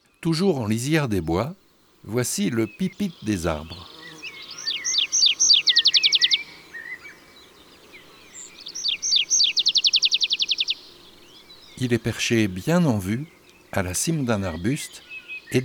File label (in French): Juin Le Pipit des arbres, chants d'oiseaux